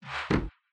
sliding door; Mystical Ninja Starring Goemon

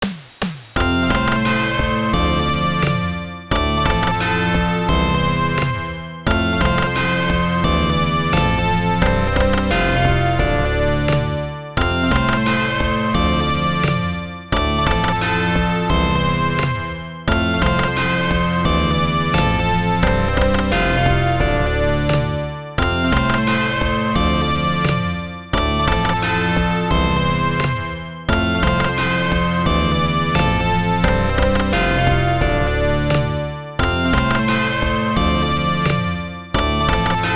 Round Clear; Ryu Kyu; System 16B; arcade

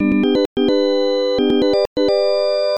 Arcade ~ Game Start; pepen ga pengo genesis

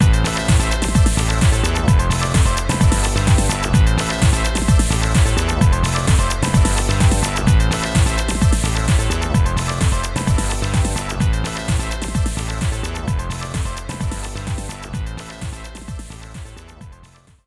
Winning Theme; Vampire Chronicle for Matching Service; Dreamcast; Gallon

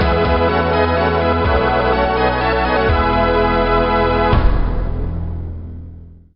Success; Battlezone Rise of the Black Dogs; N64

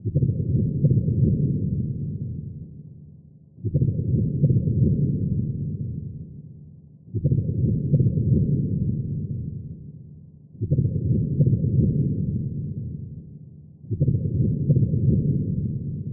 Atmospheric Heart

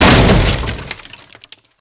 Smash Wood Break

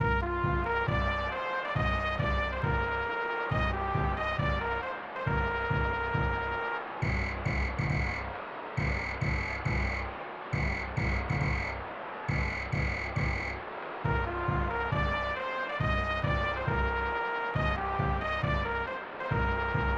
Baseball

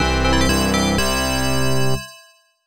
Got Item Fanfare; Arcus Odyssey; X68000

